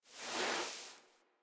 Foley Idle Stand; Warframe; Kubrow

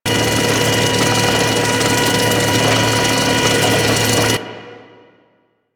Fortress Turret Rotate Loop; Ambience; Warframe; Grineer Fortress

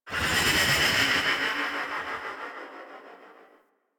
Fairy Move Thrust; DE; Warframe

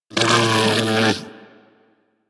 Armadillo Vocal Snarl; Warframe; Hunting Critters

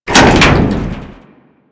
Door Hatch Open End

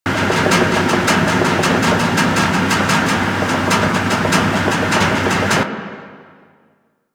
Broken Door Small Loop; Ambience; Props; Warframe; The New War; Zariman; Quests

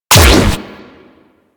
Fire; Warframe; Acrid

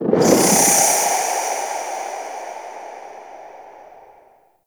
Void Arena Ghost Death; Warframe; Quests; Wraith Quest

